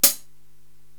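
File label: Hi Hat Closed; Korg TR-Rack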